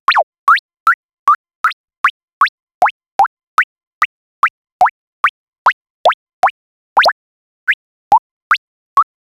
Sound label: soft game sliding sound; boop